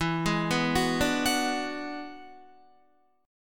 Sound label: E 9th E down Guitar; E9 arpeggiated